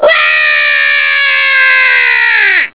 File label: uwaaa; pizza ya san